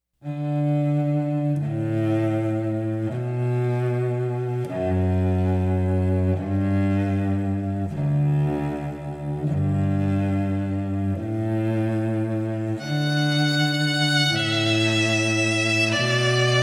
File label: Canon in D String Trio